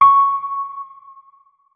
notes, keyboard, electric piano

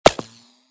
whine, bullet cracks and impacts; Anomaly Bullet Cracks and Impacts Redone

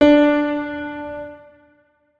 notes, keyboard piano; Hideout Furniture

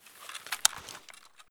hide, saiga12; Boomsticks and Sharpsticks; eft wp

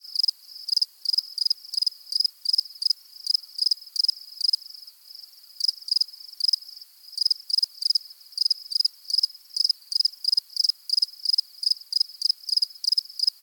insect day, ambient soundscape insects; Soundscape Overhaul

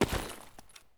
gravel, material actor step; Soundscape Overhaul

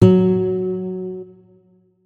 notes, guitar; Hideout Furniture

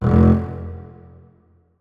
notes, keyboard; Hideout Furniture

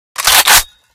unjam, weapons; SVD Reanimation; librarian svd

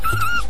death, monsters rat; Soundscape Overhaul

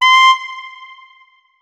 notes, saxophone; Hideout Furniture

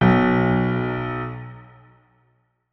notes, keyboard piano; Hideout Furniture

